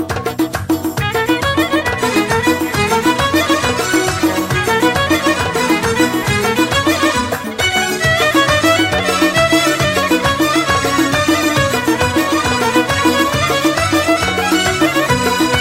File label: Violin